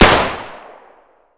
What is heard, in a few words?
ots33 fire